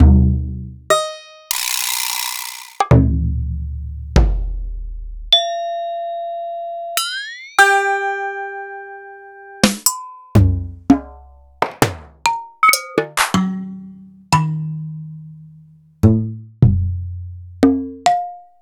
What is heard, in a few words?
EMU Planet Earth